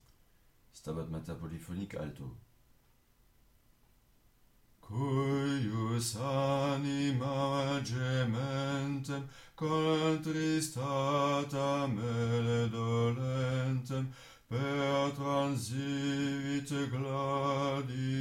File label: alto; Stabat